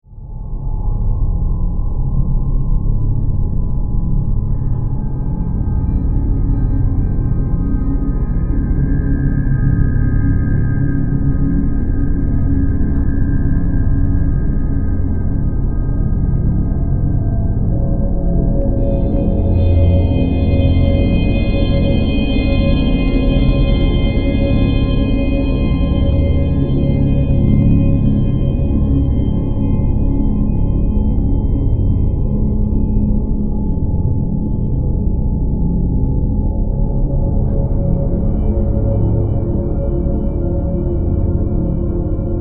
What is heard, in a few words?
Drone Scary Ambience, Drone, Scary, Ambience, Horror; 5.1